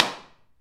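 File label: wooden room; Pro-Rec - Synthsonic; HIP HOP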